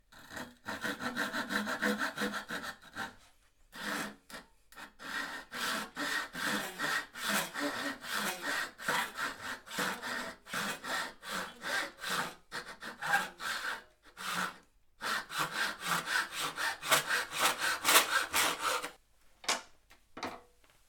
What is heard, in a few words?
Sawing Wood